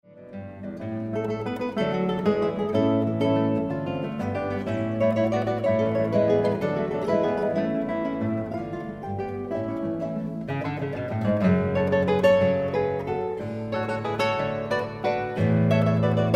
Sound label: samba, guitar